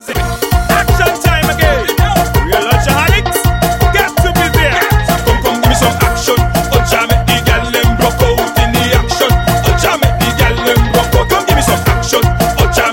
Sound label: Action Ringtone